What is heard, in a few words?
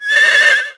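Whine, horse; Epona whining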